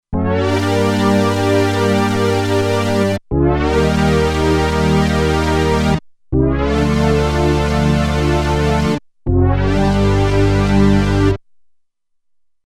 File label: Syn Glide, Roland Alpha Juno-2; Factory 2